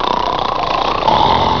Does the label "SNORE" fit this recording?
Yes